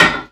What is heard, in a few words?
TOILET LID, percussive